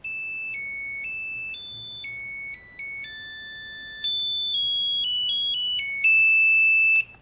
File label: nokia3210 fourseasons ringtone